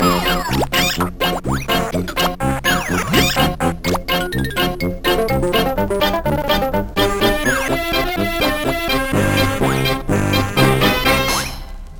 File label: jingle cartoon; Trackers; Modland